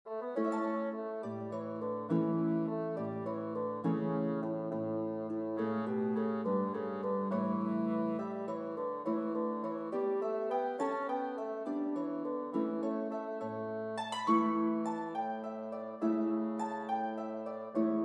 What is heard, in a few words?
Harp and Melody Instrument in C Bassoon; Banish Misfortune